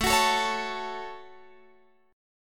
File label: A 6th, Guitar, Open F; A6 strummed; x,16,18,16,16,16